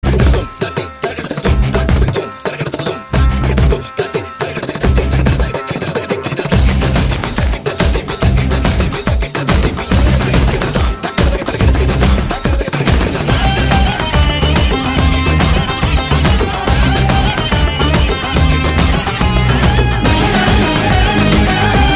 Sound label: Drum Beats BGM